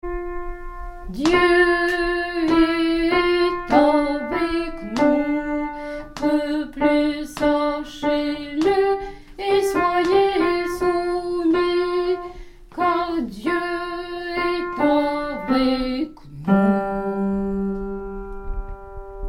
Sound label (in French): Dieu est avec nous Alto